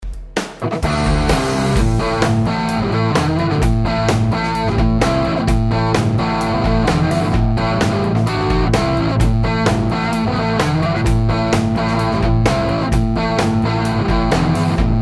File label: higain neck, Tele; schwarzbach; VP-Tele-Pre55